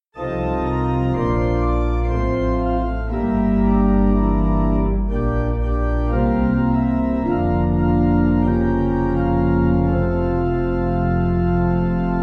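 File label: Pipe, Pipe Organ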